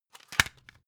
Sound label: cd cover close hard